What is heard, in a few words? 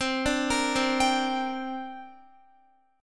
C 7th Suspended 2nd, Guitar; C7sus2 arpeggiated